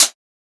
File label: Closed Hat